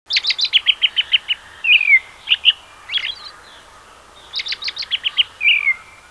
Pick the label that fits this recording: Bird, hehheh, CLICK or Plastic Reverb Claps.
Bird